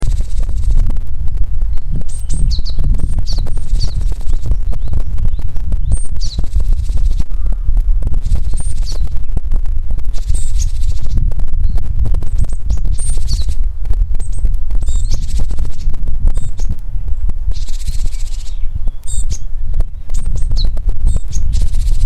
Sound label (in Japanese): 山小屋の軒先のクルミの木で泣いているシジュウカラの声です。（マイクのカバーが外れていたので雑音が入ってしまいました）